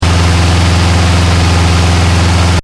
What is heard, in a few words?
Engine: Medium Revs Loop, car; Cars; Vehicles; Stuart Duffield